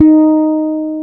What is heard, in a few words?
D#5, Bass, WARM FRETLES; Spectrasonics; Bass Legends CD 1